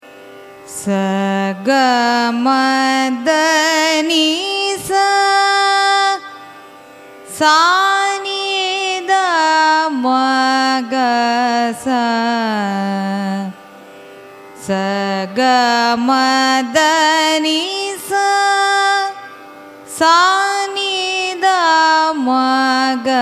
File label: raga chandrakauns arohanam avarohanam, carnatic music